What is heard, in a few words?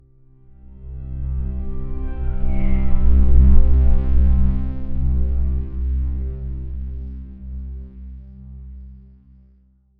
low tanpura drone fading